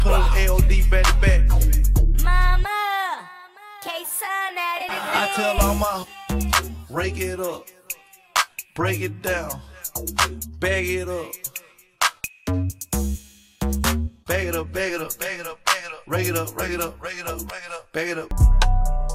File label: cheer tryout 105 bpm